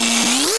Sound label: sfx door open